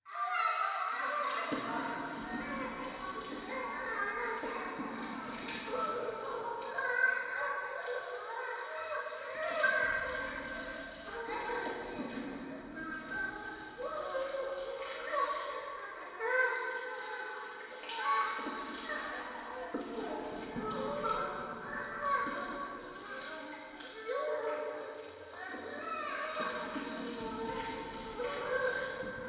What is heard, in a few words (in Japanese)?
トイレ シャブ喘ぎ 触手